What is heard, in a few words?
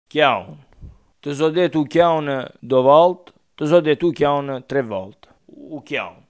piano